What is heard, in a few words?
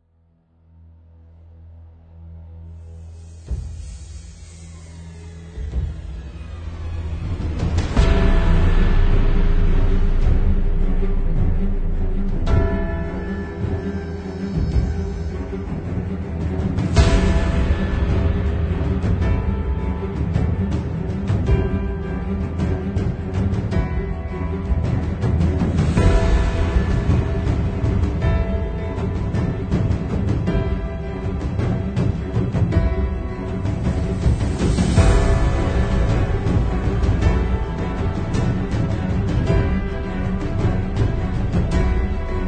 Epic